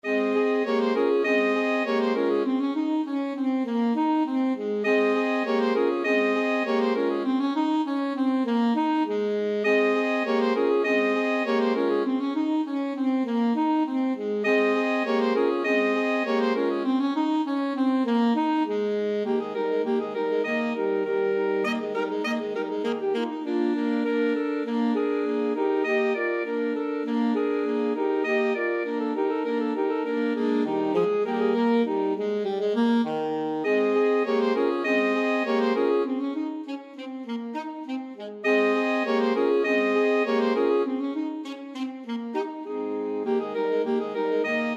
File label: bach musette, alto sax quartet